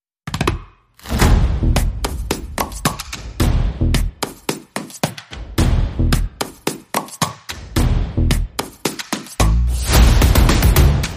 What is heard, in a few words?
Snap it Clap it Crack it, Underscore